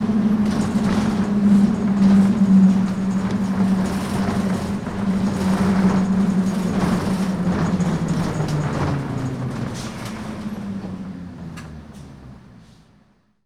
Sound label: BUS STOPPING; 1000fx